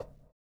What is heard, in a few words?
PERC; Spectrasonics; Metamorphosis; 3RD MAN